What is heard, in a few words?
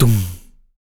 TOMS PITCHD, Male Perc, Vocal; Spectrasonics Vocal Planet; Jazz FX